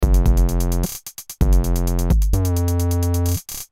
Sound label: simple 808, distorted 808 sound; Land of bits